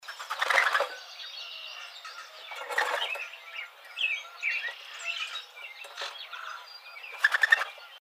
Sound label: Mourning Dove, birds